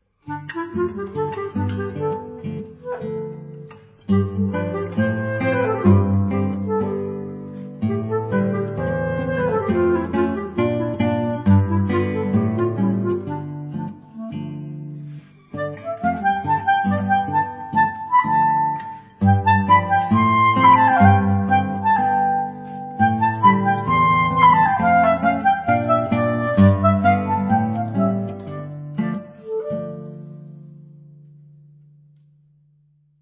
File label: ROMANIAN FOLK DANCES SUITE; PER CLARINETTO E CHITARRA